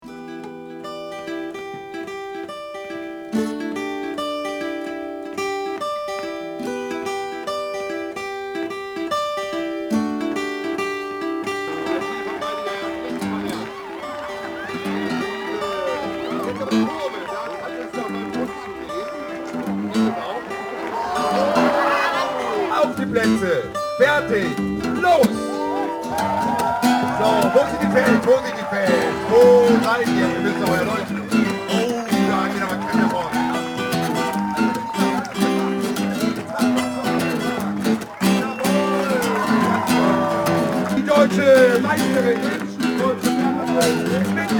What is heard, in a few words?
slush Impro; NDR